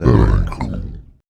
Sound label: VOICE FX, Dance Vocals